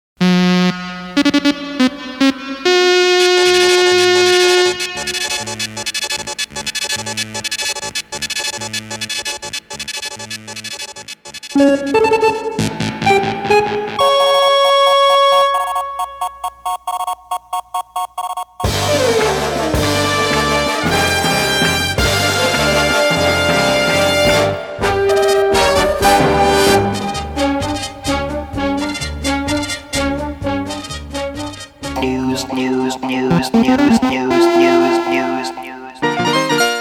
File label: News Jingles; LivingEnd